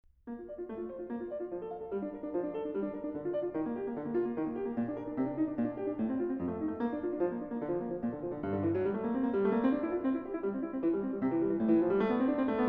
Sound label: Prelude in B-flat, The Well-Tempered Clavier; BWV 866; The Well-Tempered Clavier, Book 1; Angela Hewitt